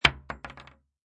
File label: Bullet Shell Drop Machine Gun Hollow Wood; Machine gun empty shells fall on hollow wood; Impact Sound Effects